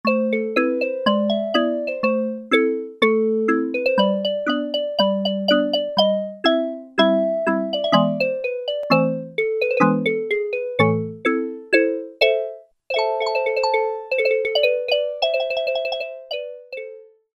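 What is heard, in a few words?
Marimba, synth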